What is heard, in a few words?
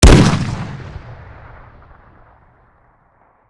fire; black ops 6; iw9; weap india fire npc